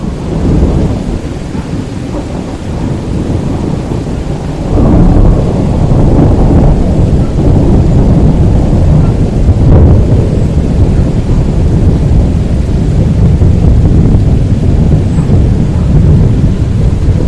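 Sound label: ambience rain; de library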